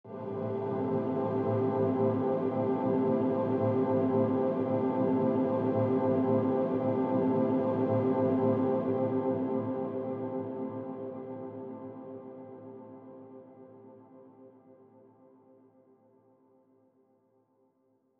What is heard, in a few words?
pad made of pads